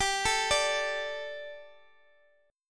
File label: G Suspended 2nd G, down